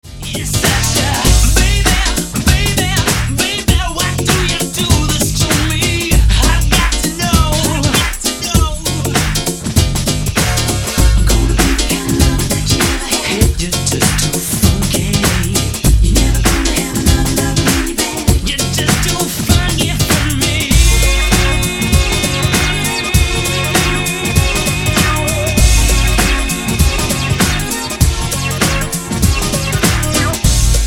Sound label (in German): 90er Pop Warm Up